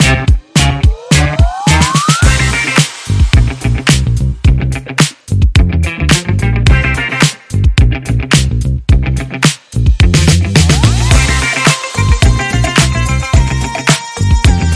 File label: Funk